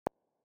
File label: 639 Hz; Connecting Relationships